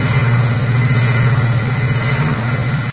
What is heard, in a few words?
engine, machines; ambient; Source Engine; video game